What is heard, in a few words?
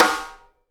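SIDE STICK, Live Snares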